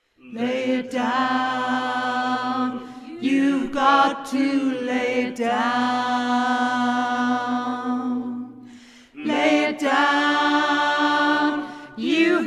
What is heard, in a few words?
lay it down alto